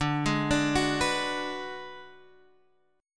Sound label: D 6th Flat 5th D down, chord; D6b5 arpeggiated